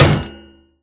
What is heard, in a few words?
Exo Hit